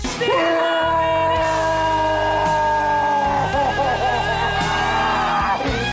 chorando

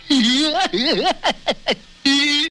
risada caipira

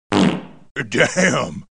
peido

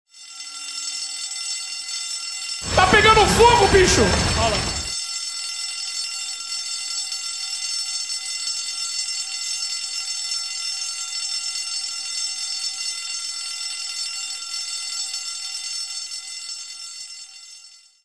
bombeiros

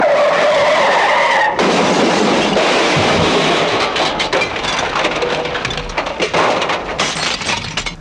batida

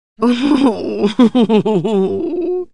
risada; windows maker

